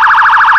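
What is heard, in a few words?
emergency vehicle phaser, sirens; whelen std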